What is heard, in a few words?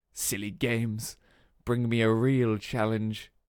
Win; Pitch Black Audio Pong